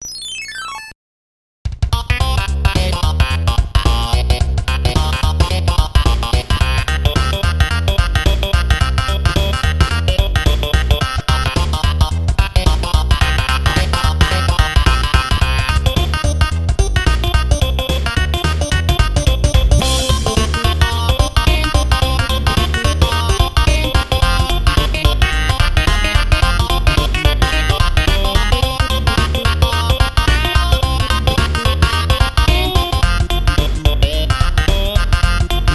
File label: Blues; Friday Night Funkin Card Game Blues ost mod windows gamerip 2023